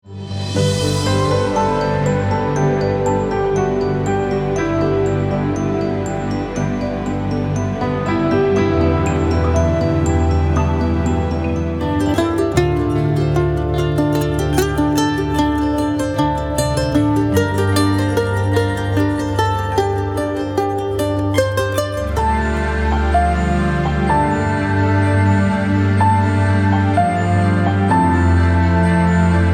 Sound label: Evocative Sampler